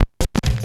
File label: SCRATCHES